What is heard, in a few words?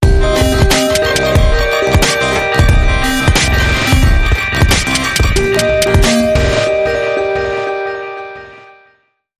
Wonky Bounce Sting